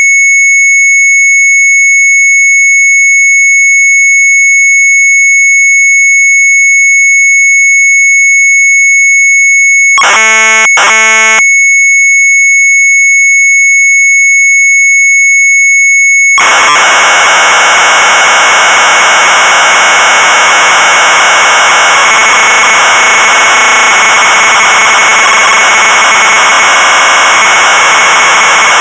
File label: Sharp MZ800 Tape; MIJNENVELD; retromelsarchive